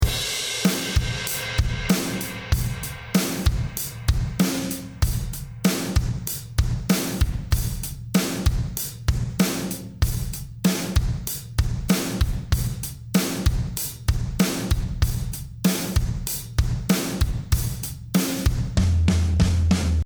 drums wet; smasher

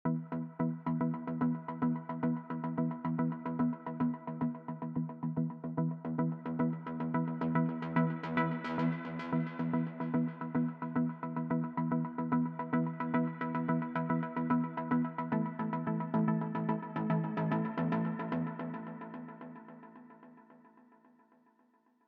Synth Pluck Wet

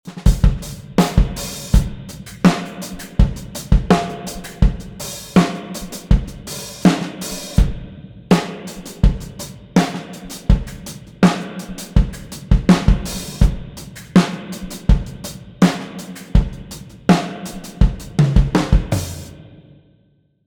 Drum Buss Tanks Tubby

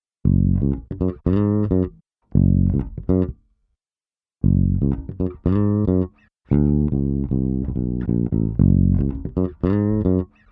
Bass High Ratio Dry

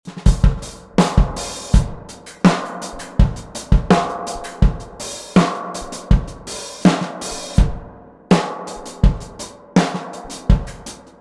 Drum Buss Tanks

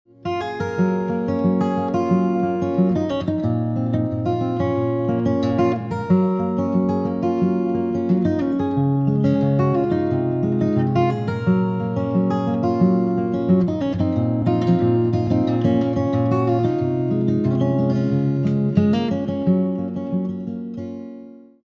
Acoustic Guitar Unprocessed

